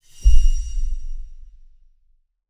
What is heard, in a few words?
Organic Magic Accept Quest Drum Impact; User Interface